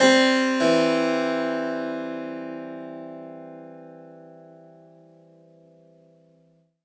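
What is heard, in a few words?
harpsichord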